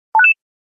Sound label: Digital button